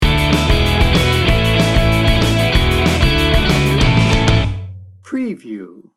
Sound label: upbeat indie rock sting bumper; Upbeat and energetic, featuring a driving Indie-Rock groove with bright electric guitars.